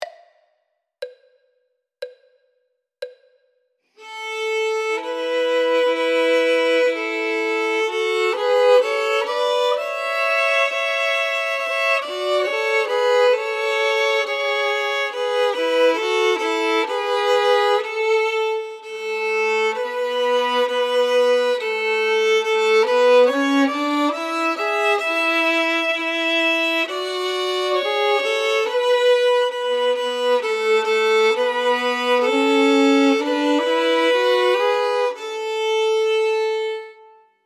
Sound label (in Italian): violino I e II